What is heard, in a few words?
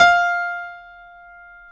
PIA GRDPI, Roland